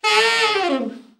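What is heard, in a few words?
TENOR overblow FALL, Sax; TEN-OVB FALL; Giga Samples Collection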